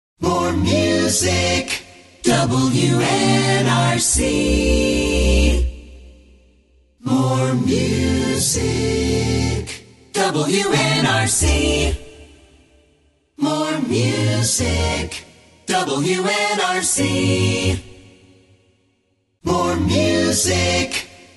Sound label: Basic Jingles; WNRC